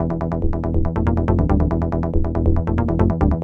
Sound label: Bass Loop